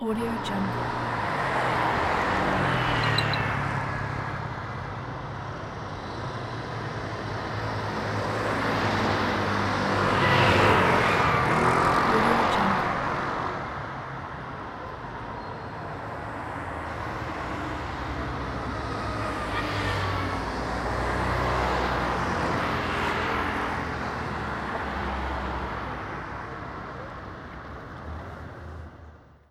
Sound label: slow city traffic ambience with scooters; stormwaveaudio